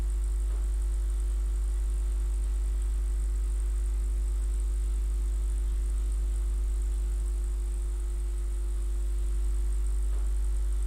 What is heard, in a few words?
Electrical Hum